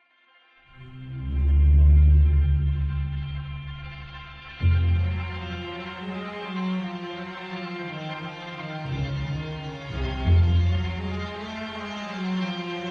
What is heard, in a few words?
shipwreck exploration; hero of sparta; iphone